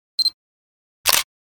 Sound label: Camera shutter click autofocus with flash